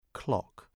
clock

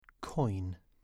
coin

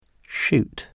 shoot

